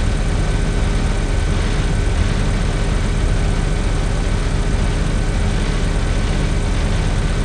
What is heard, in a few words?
generator loop; Titanfall 2